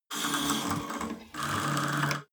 cable machine old pull release creak, fitnessstudio; sport freizeit